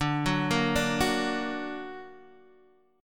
D Augmented D down Guitar Half Step Down

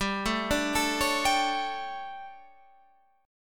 D Major 7th Suspended 4th, chord

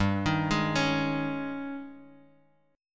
Ab Major 7th Suspended 4th G

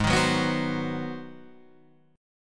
G sharp 7th Sharp 9th

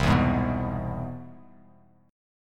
C Augmented Major 7th, Bass Standard; strummed; 8,7,6,4; chord; JGuitar

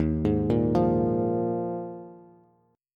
Eb Suspended 2nd Suspended 4th, Bass; Ebsus2sus4 arpeggiated; JGuitar

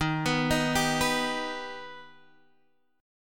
B Augmented D sharp

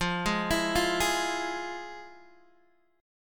F Minor Major 7th, Guitar DADGAD, chord

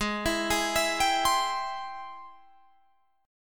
Ab Augmented Major 7th Ab Guitar Drop D

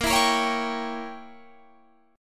A sharp Diminished 7th Guitar Standard; 18,19,20,18,20,21; strummed; JGuitar

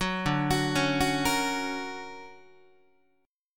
D Augmented Major 7th down Guitar; D+M7 arpeggiated; JGuitar

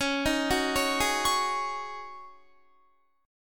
C sharp Suspended 2nd Suspended 4th, Guitar; C#sus2sus4; arpeggiated; 20,18,18,18,20,21

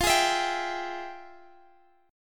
F Minor Major 9th, Guitar Half Step Down, chord

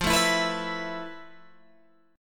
F Major 7th Flat 5th, Guitar Open G; 15,14,14,16,17,14; down; chord shape

